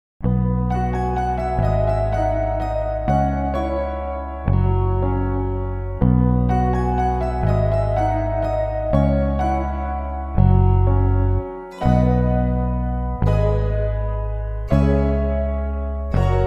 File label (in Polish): wersja instrumentalna; EduMuzArte